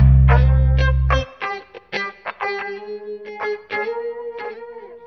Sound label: guitar groove, Loops, Phonky